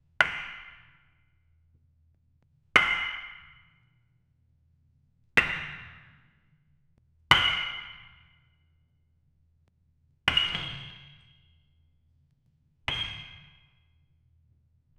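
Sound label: heavy concrete blocks falling; impacts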